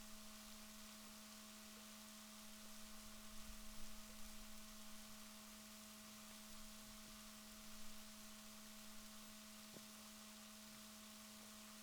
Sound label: rorquals negatif, Balaenoptera Physalus Chile